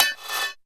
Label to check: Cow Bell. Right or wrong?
Right